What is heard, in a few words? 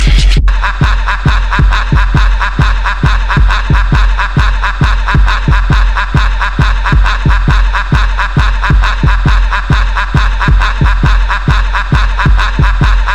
Laugh